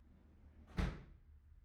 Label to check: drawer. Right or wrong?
Right